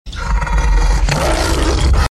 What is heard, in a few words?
scary close up roaring